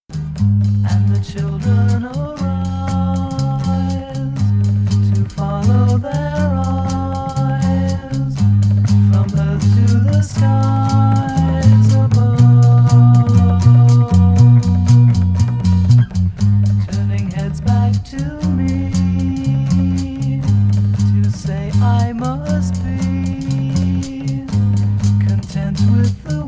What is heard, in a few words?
Children Vocal